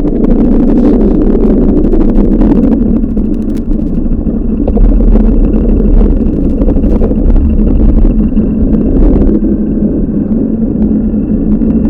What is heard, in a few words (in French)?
Moteur Piezo HF